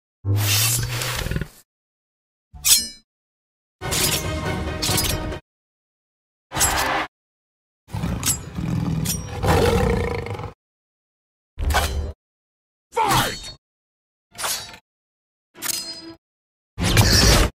tổng hợp hiệu ứng tiếng cào xước móng vuốt

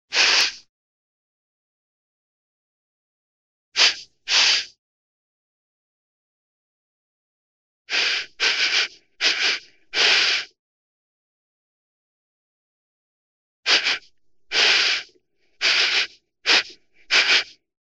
Am thanh khi ngui bang mui phat ra

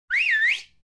tiếng dùng tay huýt gió hiu huýt

